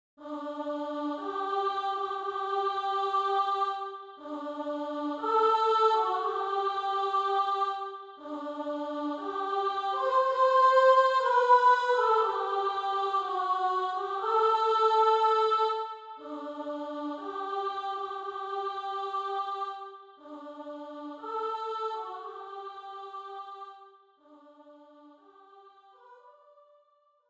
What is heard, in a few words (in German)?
solo Hochzeitsmarsch